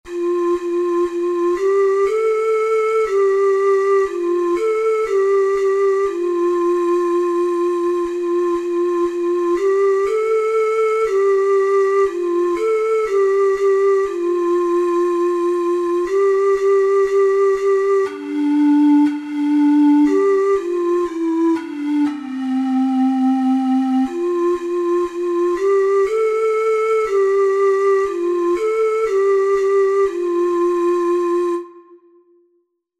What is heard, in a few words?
auclair, ocarina six hole